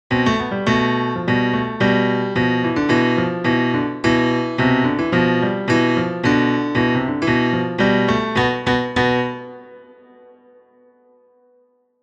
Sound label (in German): lokrisch